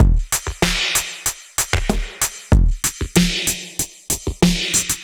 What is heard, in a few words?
Machine Drums, 95bpm, analogue circuit; musicradar